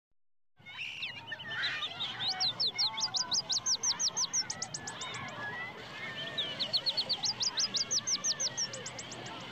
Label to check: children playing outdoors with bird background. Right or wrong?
Right